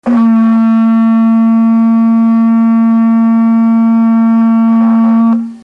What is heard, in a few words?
horn